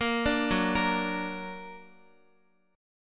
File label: Gb Augmented Guitar; x,x,8,7,6,5; Gb+ arpeggiated